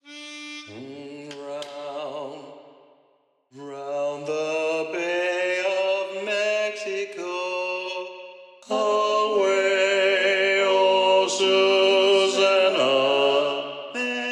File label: Bass Predominant, sea shanties